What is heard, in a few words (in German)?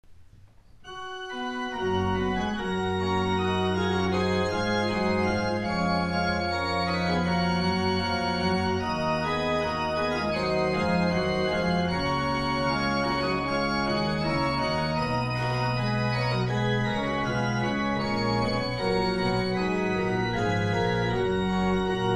Orgel